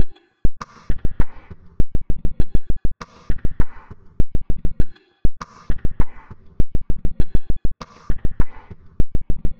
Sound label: glitch loop for artcore